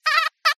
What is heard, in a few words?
cartoon fly mosquito short wing buzz